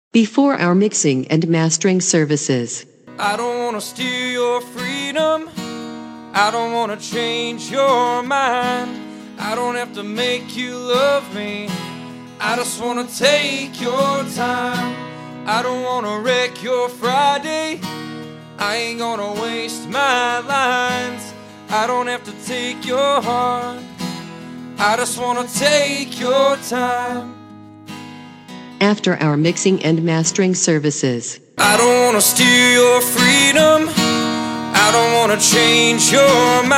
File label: acoustic; Mixing and Mastering Samples